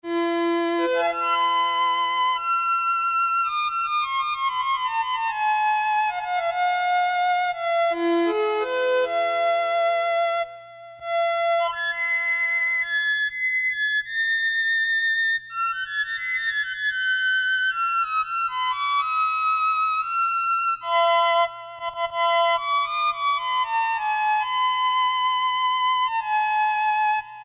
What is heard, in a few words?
violin, Casio MT-500; Tones